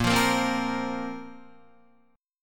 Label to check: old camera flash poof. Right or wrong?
Wrong